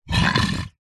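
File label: horror monster aggressive short growl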